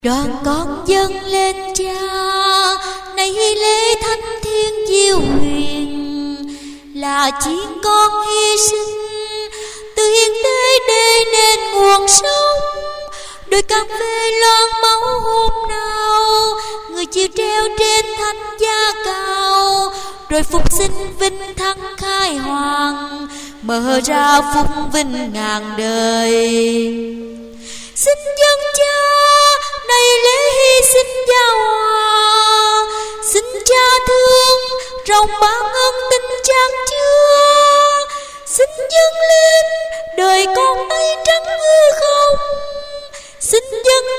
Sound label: basso